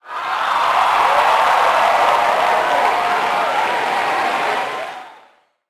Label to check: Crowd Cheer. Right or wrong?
Right